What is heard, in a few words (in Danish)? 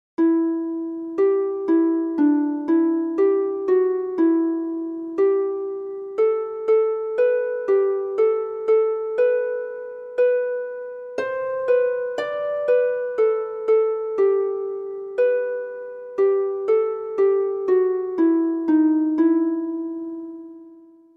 Melodi Harpe; Behold os Herre